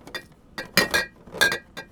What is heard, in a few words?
Foley